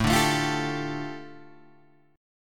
A 7th Suspended 4th Sharp 5th, A, down, Guitar, Open G